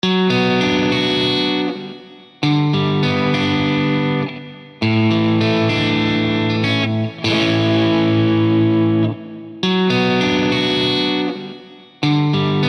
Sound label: arpeg engl e650